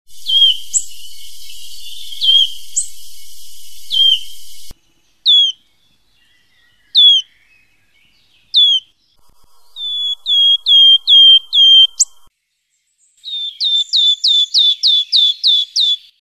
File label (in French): Autres cris de Pouillot siffleur; ATLAS NICHEURS BRETONS 2004-2008